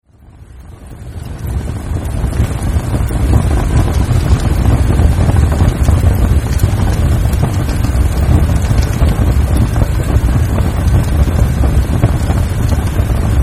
Fireplace large, Large Intense Fire Burning Paper Inside Fire Place-Closed Miked; Fireplaces; Michael Huang